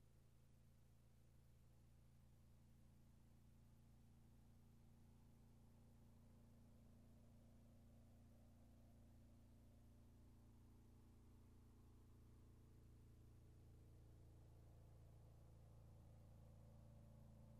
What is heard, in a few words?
Motorcycle passes by